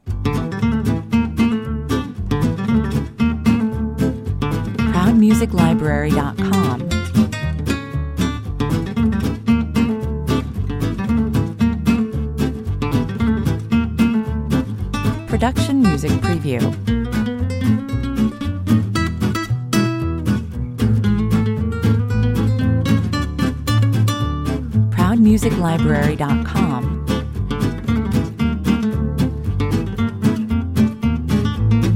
Uplifting, fun and bright, this is a Hot Club of France style acoustic jazz guitar piece, 115 bpm; Saucy and swingin'!